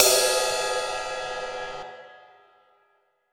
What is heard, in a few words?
Ride Cymbal; Essential Percussion